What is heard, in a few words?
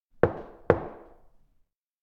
observatory knock; awayuki; anemoi